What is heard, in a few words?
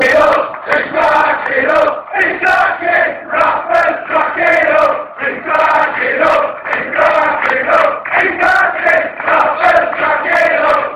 chants; hes cracking up